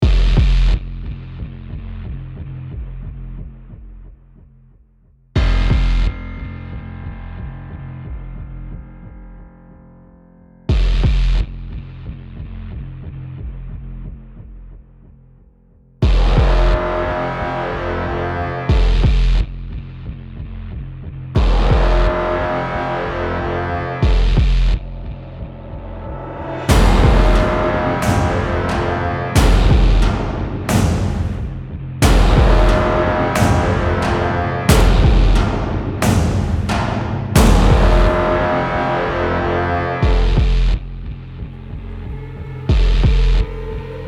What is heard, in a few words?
no guitar no strings